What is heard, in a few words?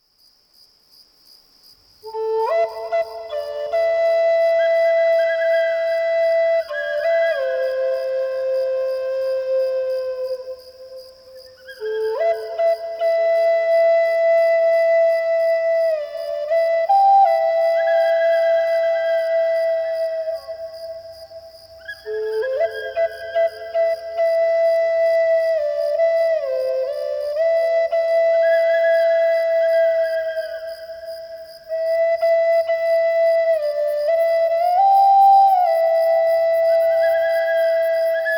Deep Sleep, Flute for Sleep & Relaxation with Sounds of Nature